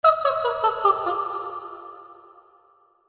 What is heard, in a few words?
PEOPLE